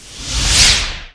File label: shield FAIL, magic; The Elder Scrolls III - Morrowind